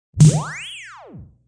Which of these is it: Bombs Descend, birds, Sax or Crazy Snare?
Crazy Snare